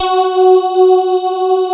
STRIA, Twisted Textures; STRYNGI

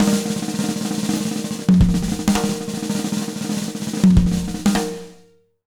100 B BEAT, Jazz Latin Drumloops

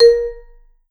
Vibes; Sampleheads; Dave Samuels; Marimba & Vibes

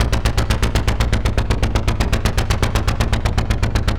Chaff Perc 120bpm; horrorscape

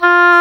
oboe, Roland

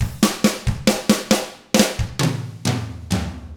SLOW ROCK, Drums; ILIO; Double Platinum Drums 1

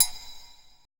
E HITUBE, ETH PERC

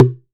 Plastic Box, household rhythm samples Hits

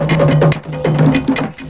Voodoo Snippit, Percussion Loops

